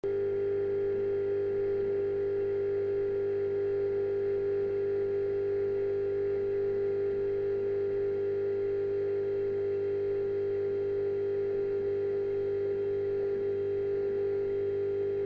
transformer, machine sound effects

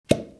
bottle pop

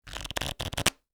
shuffle cards

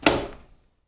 metal button

